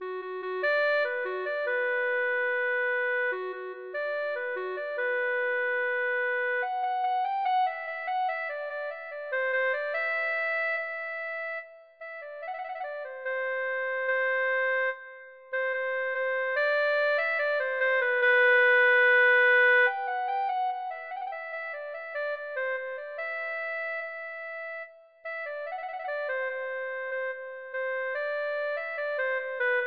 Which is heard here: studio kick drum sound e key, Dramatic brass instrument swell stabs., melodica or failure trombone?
melodica